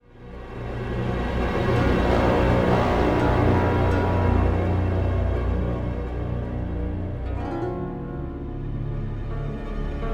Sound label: music cut ship intro music; Jack Keane 2 The Fire Within; Windows gamerip 2012; Act00 shanghai